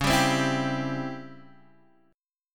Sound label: C sharp Minor Major 7th Flat 5th C sharp Guitar Open D, chord; x,4,5,6,7,5